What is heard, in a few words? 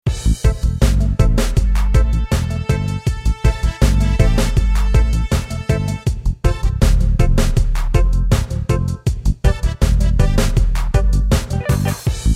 Roland MC909 reggae